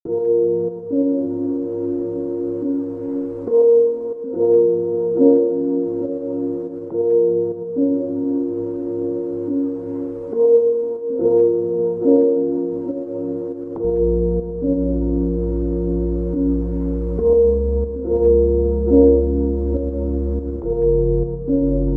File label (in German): thema beat